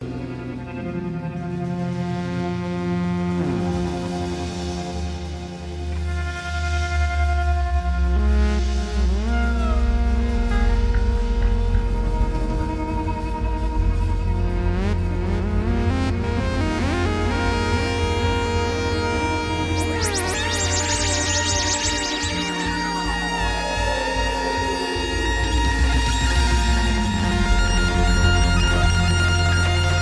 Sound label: EWI 4000